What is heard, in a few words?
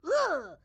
grandma short yell; Blind Drive